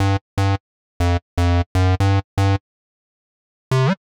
Synth Bass G; PowerFX - Nu Jazz House

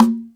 Percussion, Drum Machines E-MU Pro-Cussion

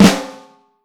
FLAM, Vintage Snare Drum; Q Up Arts; Johnny.C's Vintage Snare Drum; 6.5X15 LUD

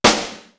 SMAKE SNARE; East West; Global Sounds

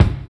VINYL BD, Drums Dance & Groove; USB Soundscan vol.11; AKAI; VINYLKIT

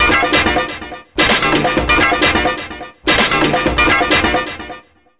127 sound 1 L, Fresh Disco House

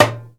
PRC MED SLA, Congas; Roland - Rhythm Section; Latin 1

